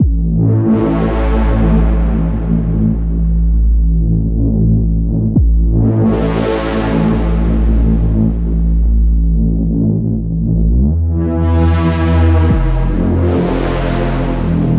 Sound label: Particle Suspense, bass synth; Wave Alchemy - Bassynth 1.1.0